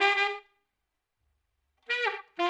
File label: OLDSCHOOL BRASS 96